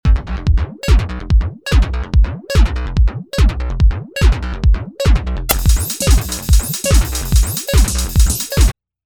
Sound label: Oscilloscope; Omicron; AMBIENT